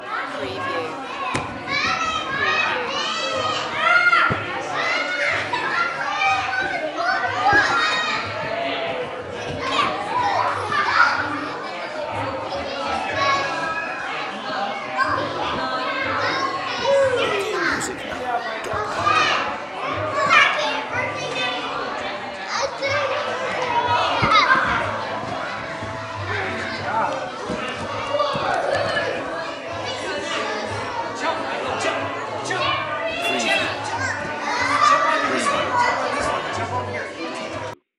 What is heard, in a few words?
Tons Of Kids Play